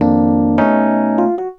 CHORD, Drum & Bass, 150 BPM

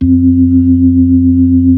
B-3, Club; Roland LCDP10 Keys of the 60s and 70s 2; Club Ch. Xfde

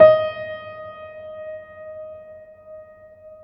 PED MF, GRAND PED

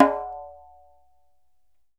DJEMBE, African Drum

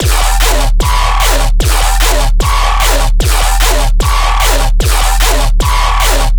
bass loop hardcore 150 D#; Virtual Riot

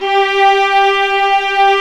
STR, Vlas Sect, Agitato; Roland L-CD702; Vlas Sect1-2

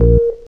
jam synth, E keys

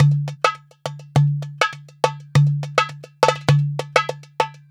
MEH1 PERC, Balkanish Traditions

